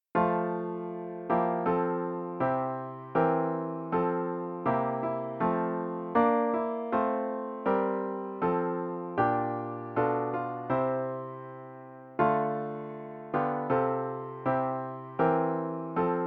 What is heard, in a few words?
piano; haydn joseph; partition pour piano; hymne national allemand; allemagne